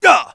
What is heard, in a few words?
damage; mohaa; dialogue